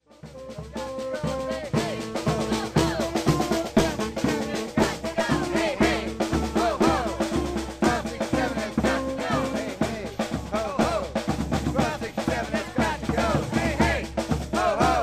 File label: marching band